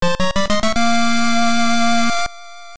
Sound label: fanfare item extended; Zelda